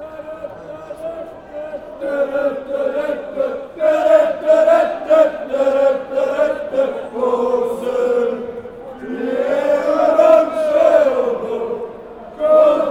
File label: chant; aalesunds